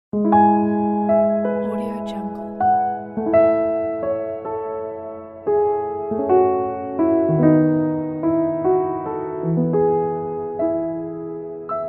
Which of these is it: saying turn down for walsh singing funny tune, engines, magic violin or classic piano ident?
classic piano ident